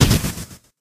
hazards gas rockets; spider-man-mysterio-rush-game

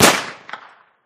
shot; soldiersfury

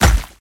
hit; Ninja-Action-2

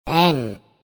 connect; monstergo; pokebot

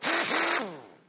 ui btn kit confirm; lightning speed

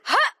jump; star wars rebels team tactis; sabine

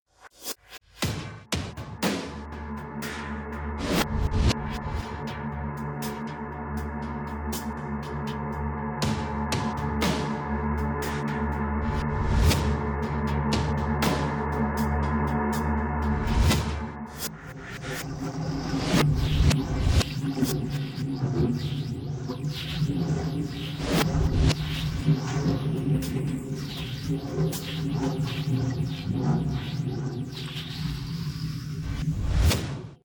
main music; Rolling-Panda

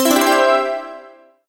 button click; incredible hulk chitauri takedown

